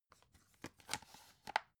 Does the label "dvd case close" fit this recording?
Yes